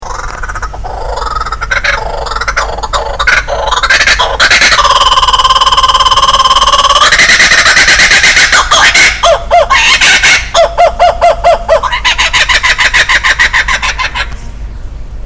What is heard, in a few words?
kookaburra; animal sounds